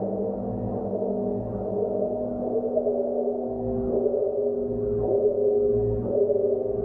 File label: SPACE SHIPS; SpaceSounds